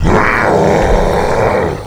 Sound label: nemesis pain; cstrike